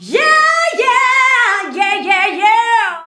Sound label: VOX, Singin